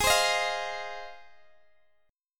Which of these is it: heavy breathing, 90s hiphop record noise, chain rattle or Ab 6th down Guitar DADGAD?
Ab 6th down Guitar DADGAD